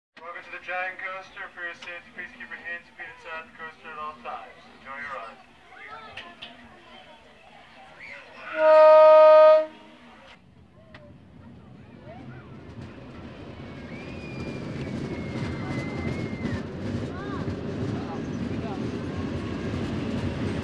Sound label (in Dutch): Rollercoaster Rit; KMP Film Video Sound Effects